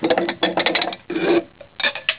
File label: glasses being put away